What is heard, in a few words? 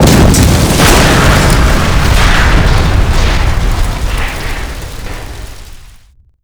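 tank explosion large; WAR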